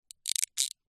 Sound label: plastic thin small pressure creak